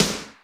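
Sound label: Snare Slap It, Alesis D4 drum machine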